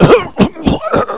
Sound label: choke